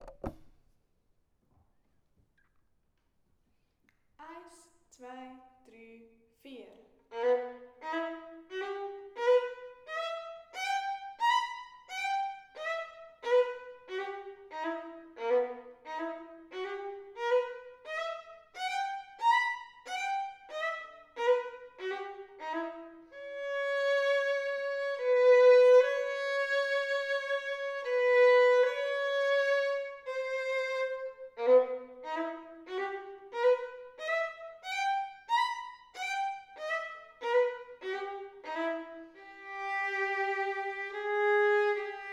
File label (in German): Violine; Kinderorchester; Kängurus; Violine 1